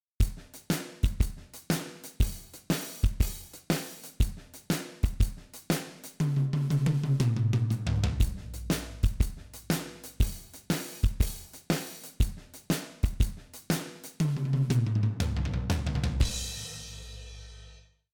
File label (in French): batterie avec comp670; harm90